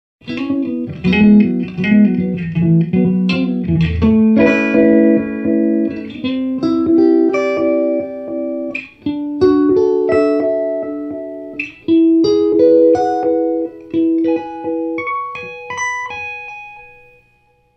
Pivot; GFX CHOPPER

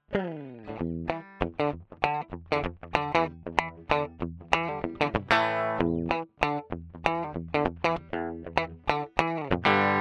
FAT crunch

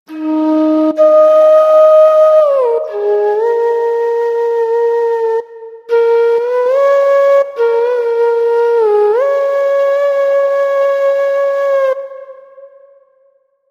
Indian Flute

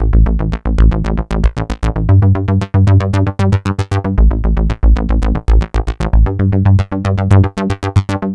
bass

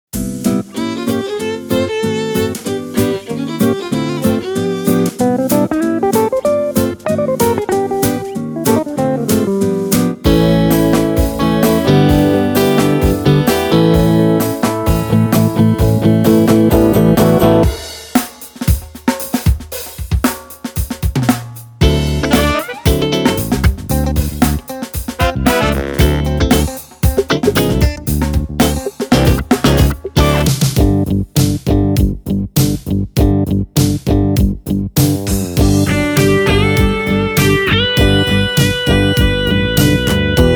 Guitar

